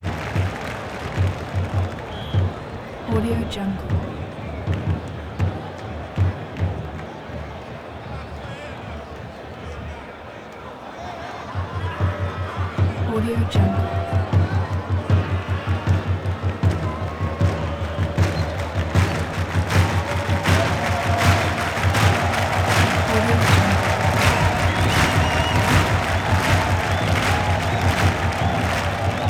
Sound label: soccer crowd chant Brazil drums beat in rhythm